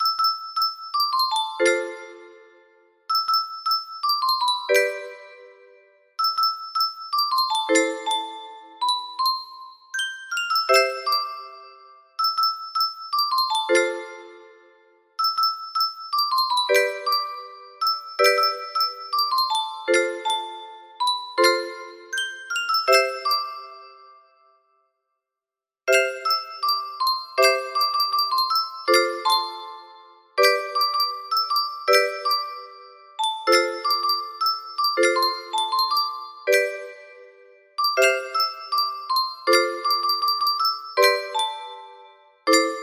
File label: grand piano